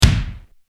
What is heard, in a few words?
Bass Drum Room; SONOR; Trigger